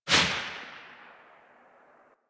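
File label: submachine gun Tail; Warframe; Tenno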